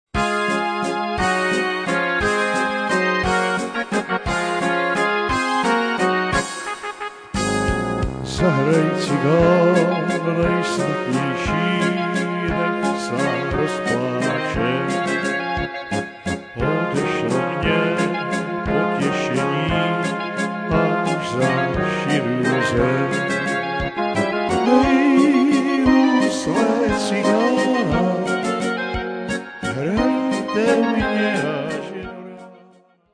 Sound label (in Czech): Valcik Cikanovy housle